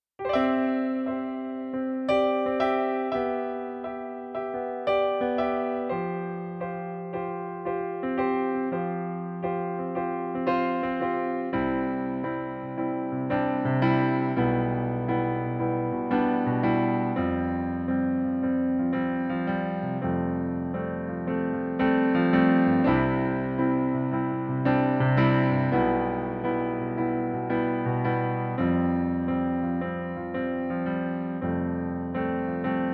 Pianoplayback sängerin

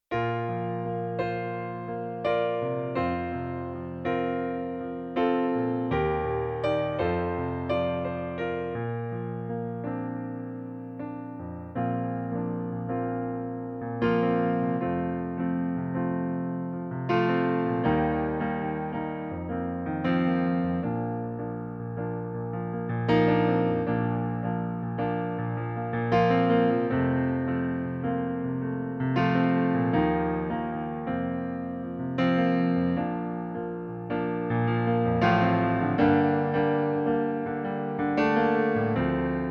playback sängerin

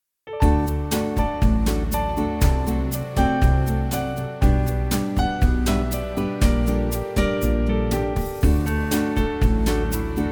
BossaNova vollinstrumentiertes Playback D-Dur

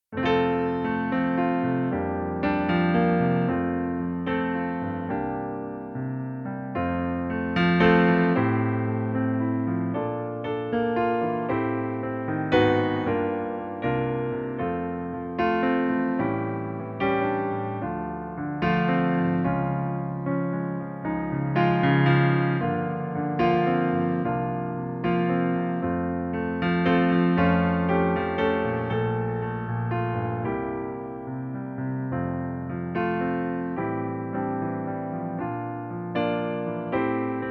Pianoplayback F-Dur

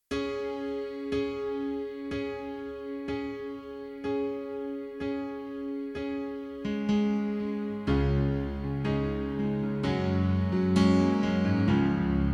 Pianoplayback C#-Dur

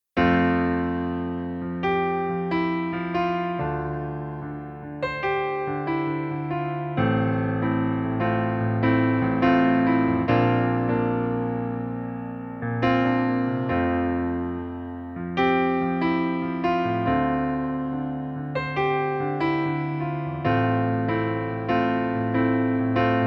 Pianoplayback F-dur

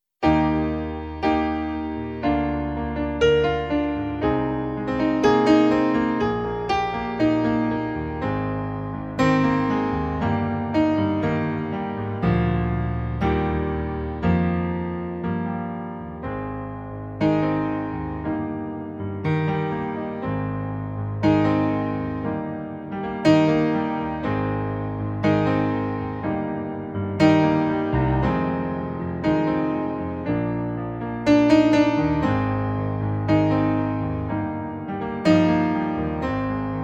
Sängerin Pianoplayback Eb-Dur 60 Bpm

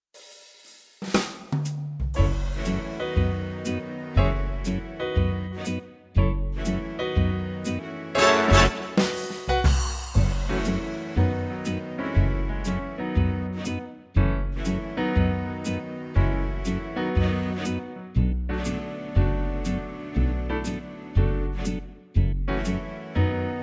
vollinstrumentiertes Playback ClassicBigBand F#-moll

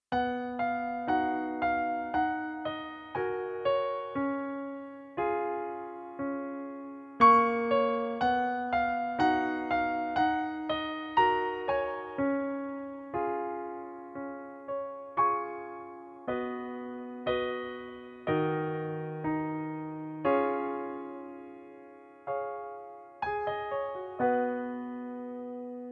Pianoplayback

